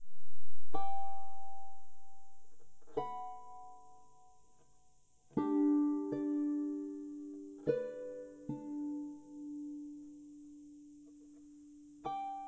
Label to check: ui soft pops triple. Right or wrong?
Wrong